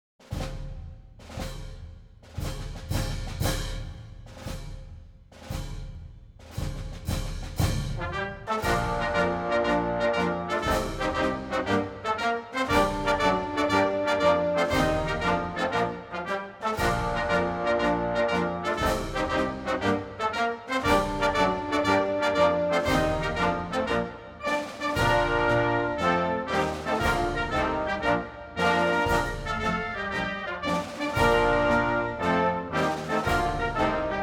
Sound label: Algeria national anthem